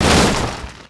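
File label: machine wreck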